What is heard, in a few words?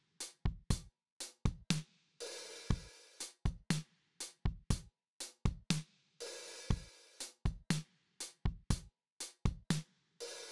Loop